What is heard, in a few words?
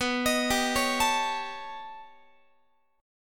B Augmented 9th B Guitar, chord; arpeggiated; JGuitar